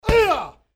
karate kick hand pads

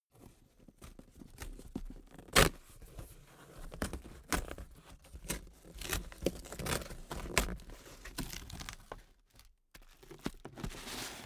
cardboard box big open handle

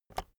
keyboard external enter release

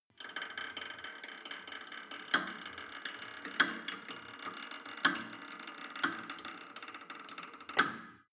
cable machine creak down

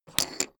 poker chip stack small fall down

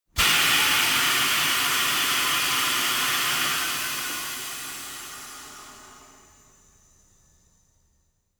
tire changing machine hydraulic hard in

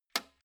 old switch harsh snap

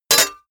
sword block metal

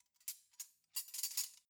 cutlery knives handle soft

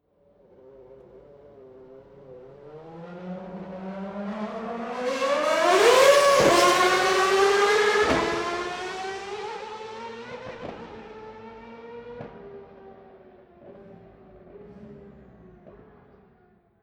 racing car f1 accelerate heavy

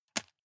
juggle catch medium

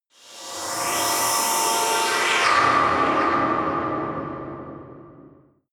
whoosh scifi vortex

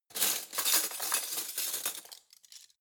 cutlery spoons drawer grab hard

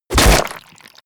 club impact crack wet

